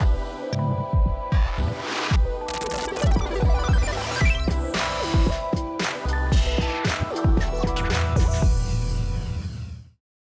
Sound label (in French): Spot Instrumental; 10sec; non masterisé; Fete de la radio 2023